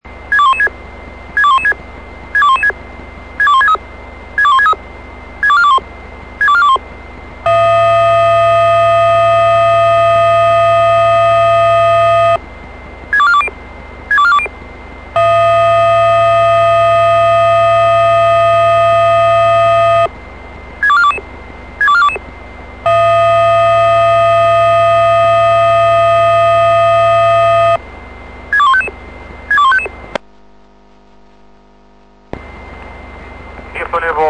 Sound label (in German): Alarm